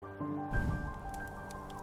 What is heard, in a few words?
celeste sound fire